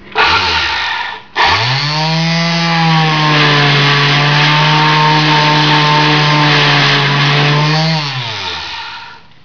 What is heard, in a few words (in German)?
Bohr